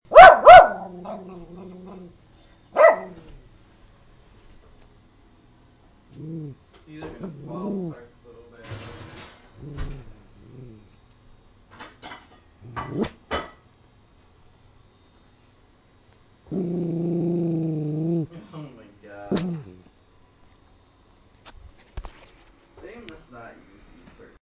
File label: barking